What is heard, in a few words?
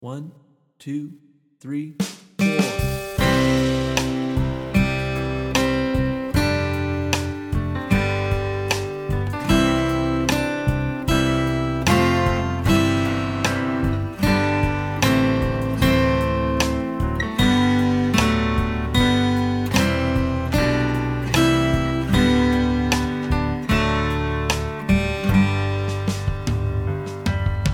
Slow Play Along, guitar; O Come All Ye Faithful